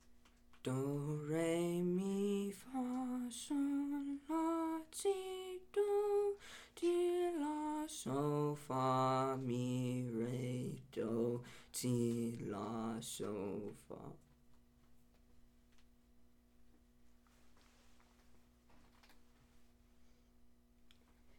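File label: Baritone; allstate2024 jrhigh; Audition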